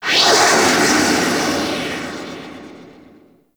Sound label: Exterior Solar Winds; Ambience; Warframe; Corpus Railjack